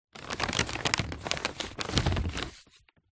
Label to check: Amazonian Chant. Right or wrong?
Wrong